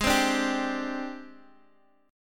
Ab Major 7th Flat 5th Ab Guitar Open G

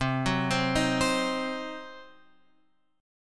C Diminished C; Cdim Chord {8 9 10 8 X 8} arpeggiated

